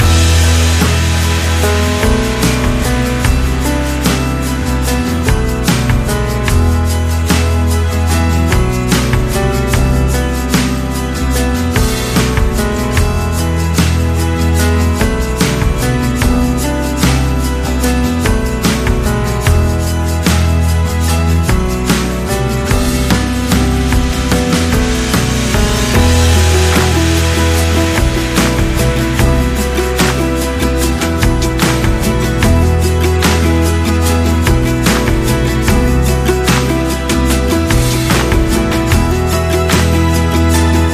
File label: Positive Cinematic Rock Loop